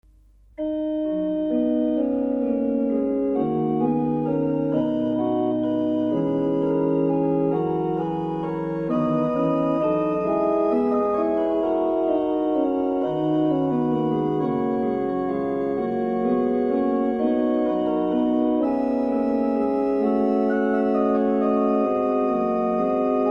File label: Mass for Organ Kyrie; Christe, aller Welt